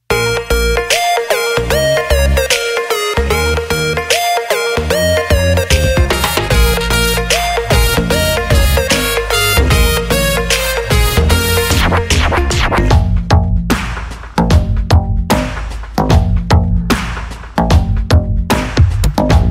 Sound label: Startup Tone